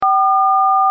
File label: keypad press